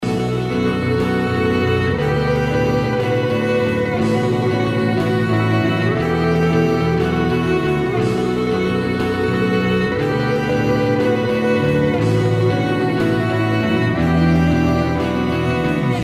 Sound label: drums and vio